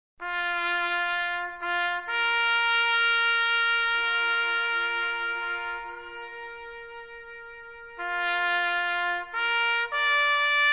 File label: bugle call taps echo duet, air force reserve concert band